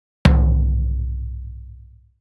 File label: TOM; horizon